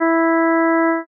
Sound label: trumpet